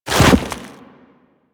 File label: Walk Deep Snow, Footsteps; Warframe; Operator